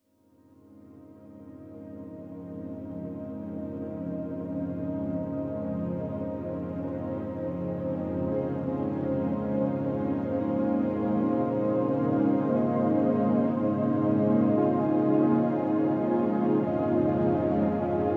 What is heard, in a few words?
Overlay Drone; The Shard; Mirror's Edge Catalyst